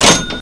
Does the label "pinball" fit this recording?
Yes